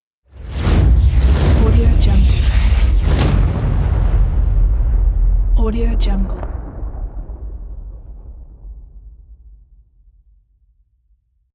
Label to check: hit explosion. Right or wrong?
Right